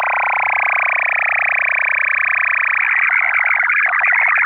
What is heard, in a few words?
BUL-240 intro; BUL DIPLO 8-tone MFSK ARQ with intro + IRS-mode